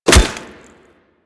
Melee Staff Impact Flesh; Warframe; Weapons; Staffs

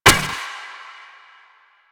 Updated Shot, Weapons; Warframe; Moa Pet; Multron

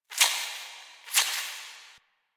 interior hiss; Ambience; Misc Indoor; DE; Warframe; Infested Eris

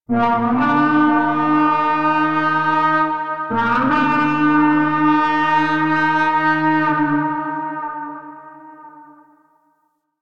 Town Horn Alarm; Ambience; Duviri; Warframe

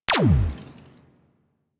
Amp Barrel Tech, Weapons; Warframe; Operators; Amplifiers; Amp Set 2

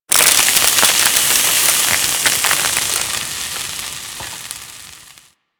Large Fire Extinguish; Warframe

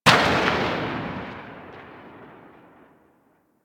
SMG Tail Ext; Weapons; Warframe; Tenno; Sundial

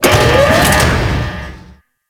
Platform Start; Ambience; Warframe; Grineer Fortress

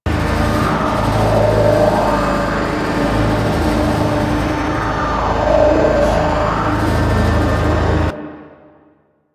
Large Elevator Loop; Elevators

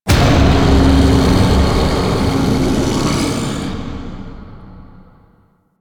UI Door Almost Open; Warframe; Entrati; Vaults

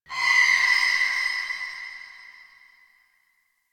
Spirit Chatter, Ambience

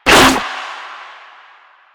Arm Attack; Enemies; Warframe; Proto Infested; Runner

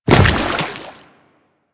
Step Water, footsteps; Warframe; Grineer

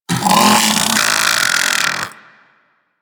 Infested Splitter Top Vocal Death; Warframe; Grey Strain

